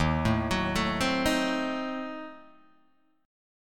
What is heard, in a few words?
Eb Diminished 7th Eb, Guitar Open G